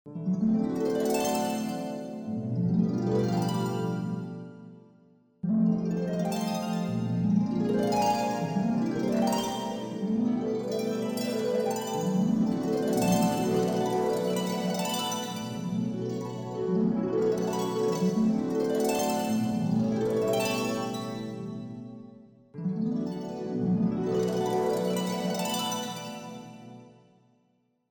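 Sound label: harp, chamberlin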